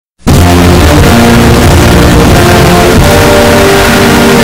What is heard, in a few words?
anthem bass boosted; usa